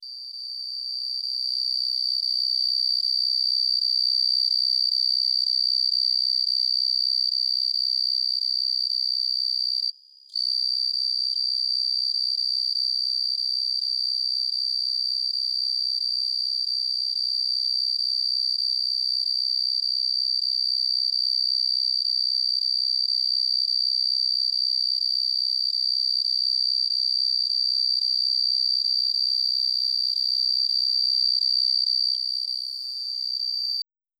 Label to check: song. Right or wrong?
Right